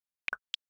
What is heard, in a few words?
cam popup open; LG Wing 5G SKT